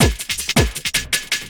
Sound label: Loop, Drum & Bass, 160 BPM